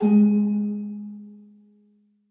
melody Ab3; fairymirror

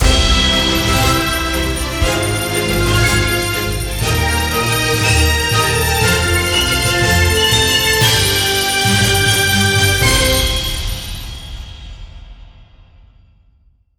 Music Bigwin coin; godsoffortune

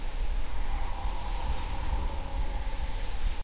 random Wilds Main Loop; arcane

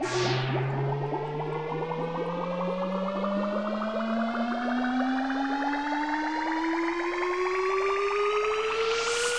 near Win; east sea dragon king

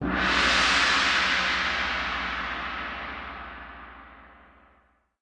free spin end; jack and beanstalk

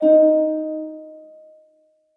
melody Eb4; fairymirror

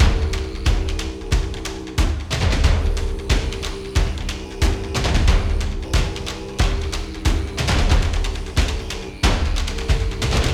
stampede Layer; jumanji

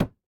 reel Bounce

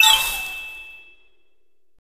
candy Fall Med; fairyhansel

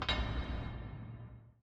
Reel Stop; godsoffortune

